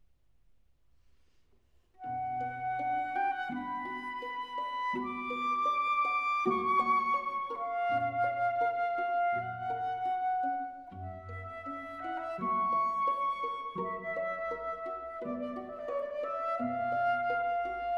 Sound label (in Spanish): flauta